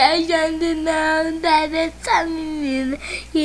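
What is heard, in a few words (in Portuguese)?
CANÇÃO, SONS PEDIÁTRICOS; PEDIATRIA UFF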